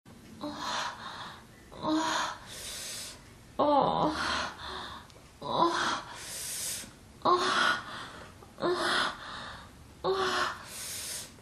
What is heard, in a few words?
solo, moans; The Witcher Hunt